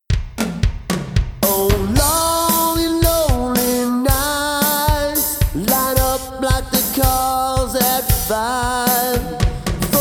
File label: vocals; music smorgasbord